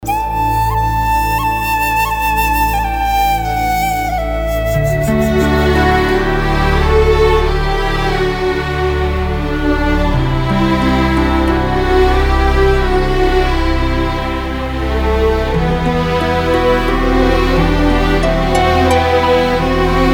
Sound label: Instrumental